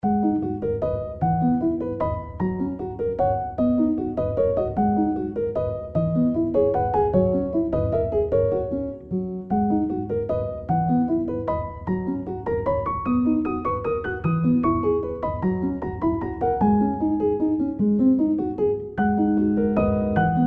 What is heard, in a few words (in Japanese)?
いい感じになりそうなピアノ, フェルトピアノ